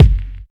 hot bass drum g key, Royality free kick one shot tuned to the G note. Loudest frequency: 233Hz